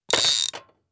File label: hook In, fishing; Cosmic Rage; The Experience-light